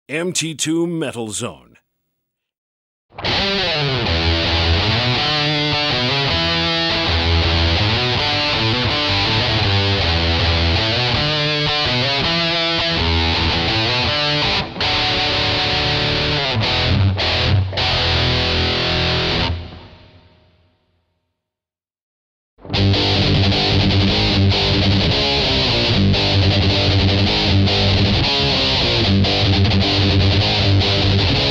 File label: tone demo